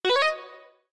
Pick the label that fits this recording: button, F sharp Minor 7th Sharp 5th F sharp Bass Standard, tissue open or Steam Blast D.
button